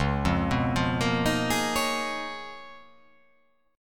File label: Db 13th Db down, Db13 chord; arpeggiated; JGuitar